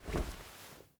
flamethrower deploy; Rust

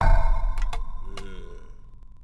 Build Warrior; Civ4 warlords

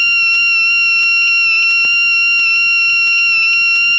Aircrafts Cockpits RWR Sa8 TT; DCS

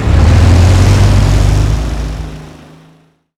Shilka engine acc start; DCS

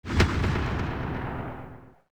carbine 1shot echo

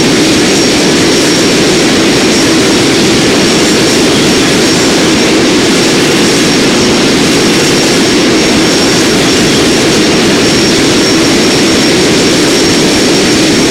engine tvd 2300rpm; War Thunder

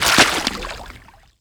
foot water shallow, footsteps

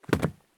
landing wood; Escape from Tarkov

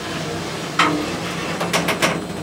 turret; World of Tanks

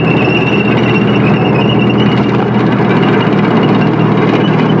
Vehicle Engines; Red Orchestra Ostfront 41-45

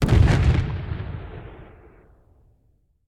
aa explosion; War Thunder

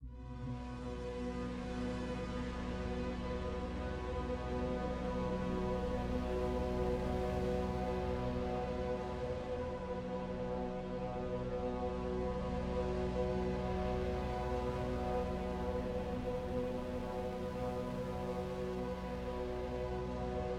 music interactive; World of Tanks

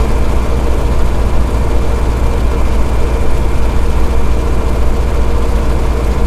bearcat cockpit, engines; War Thunder

